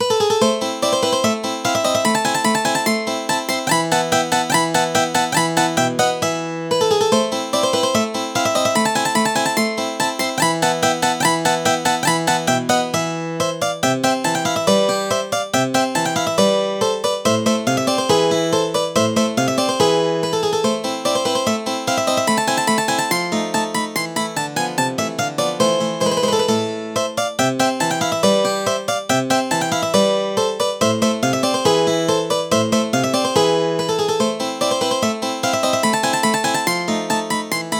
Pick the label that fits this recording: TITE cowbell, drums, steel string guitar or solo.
steel string guitar